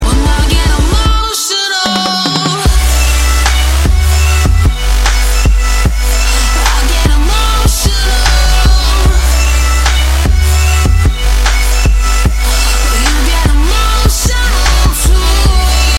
emotional beat drop; Voicemod

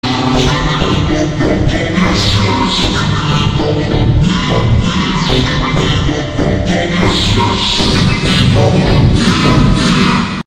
triple toilet; Triple Toilet meme

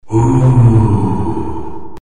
oooooooooooo

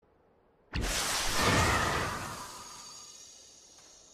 ice blast

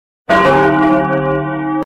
bell sound effect; Loud ahh; Voicemod

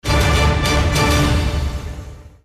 epic sound; Epic sound meme

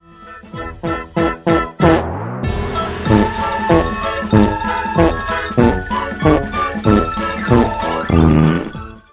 fartfare

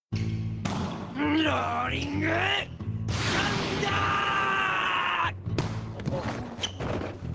rolling thunder

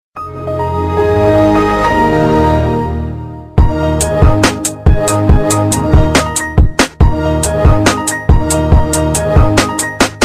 windows startup remix

